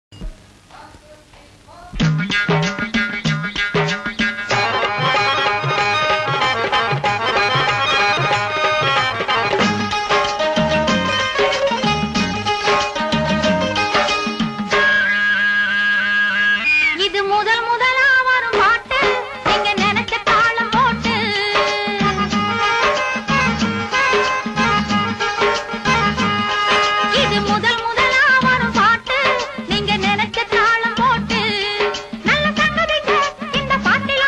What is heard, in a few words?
Female; Murali; Pudhu Vasantham